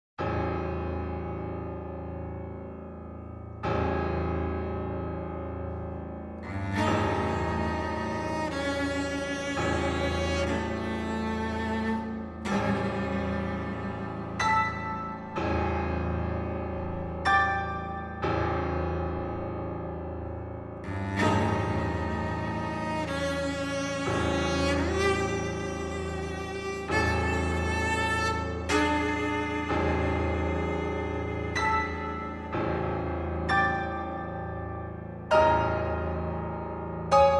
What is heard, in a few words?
trio